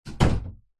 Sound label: household door internal wooden modern close other side bedroom room environment